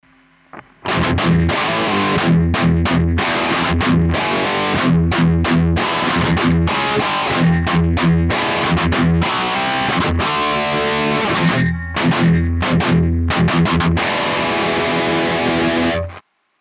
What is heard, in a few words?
backing; Triaxis